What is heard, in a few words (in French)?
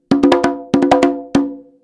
frise, congas